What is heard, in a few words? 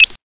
future beep